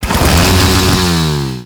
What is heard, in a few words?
Saw Accent Rev; melee; weapons; Warframe; Grn Ghoul Saw Player; Kuva Ghoul Saw